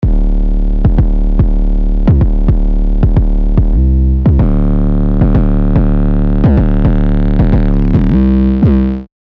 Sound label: 808, Party Reggae; revealed serum 2 808 vol 1